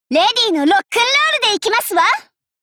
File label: warcry